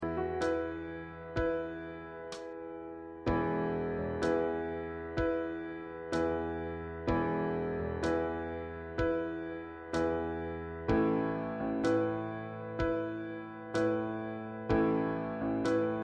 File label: Piano - Drum